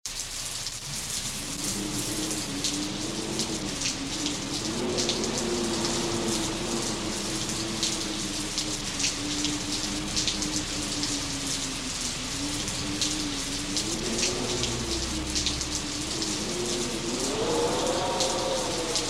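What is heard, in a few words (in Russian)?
гудящий ветер и дождь; Звук ветра и дождя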